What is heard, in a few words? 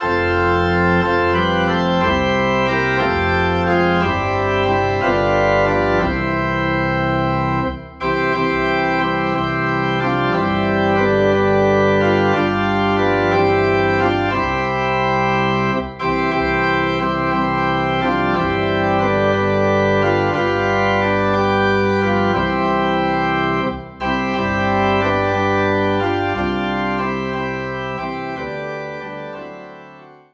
Organ Low in C